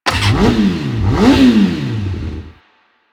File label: Mount, Motorcycle; Motorcycle Ninety Nine